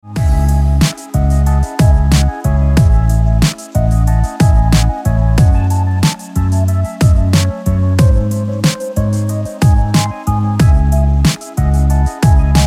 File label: instrumental version